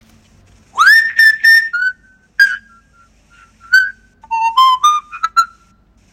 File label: whistling an Italian song